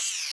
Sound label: zoom out